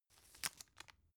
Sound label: tissue open soft